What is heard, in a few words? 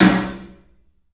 PA DEEP R, CLUB SN VRB; 3D Sound; 3D Drums